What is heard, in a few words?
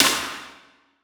Snare, Dubstep Snares; GhostHack